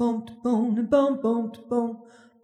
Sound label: Jazzy Solo